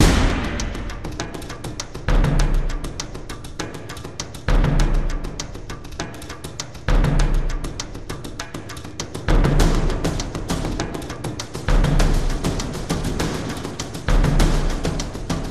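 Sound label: percussion loop; transformers war for cybertron gamerip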